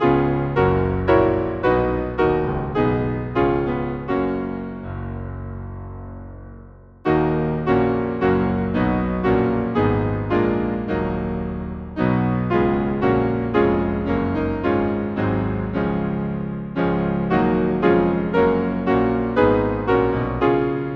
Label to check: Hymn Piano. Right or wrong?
Right